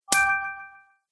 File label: Loot Coin, video game